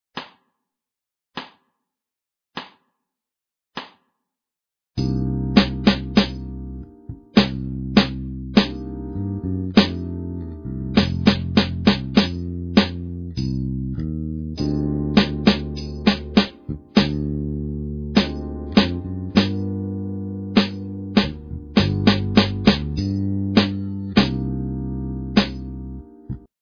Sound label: Drumset; Steps Ahead In Drumset